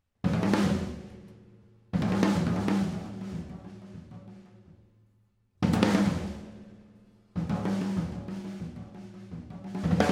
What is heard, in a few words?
Drum Solo (Jazz)